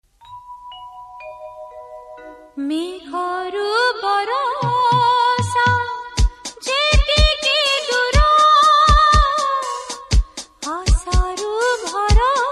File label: duet